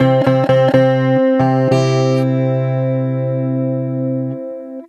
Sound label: Ac 12 Str Gtr C free, Twelve String Guitar, African Guitars; Quazibeat - African Secret